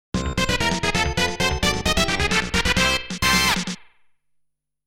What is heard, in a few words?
Goal Fanfare